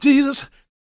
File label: GOSP MALE, Vocal Planet Gospel